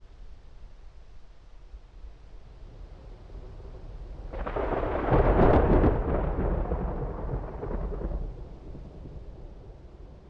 DISTANT ROLLING THUNDER

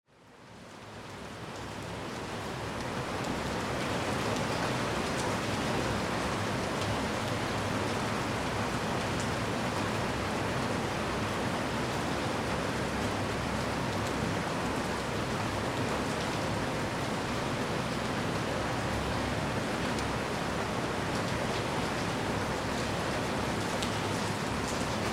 CITY RAIN

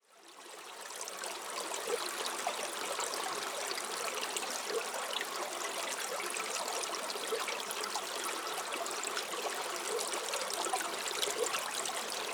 MOUNTAIN RIVER

